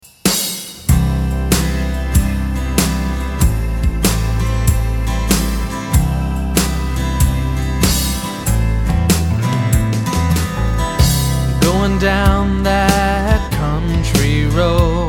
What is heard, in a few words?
Country Road rock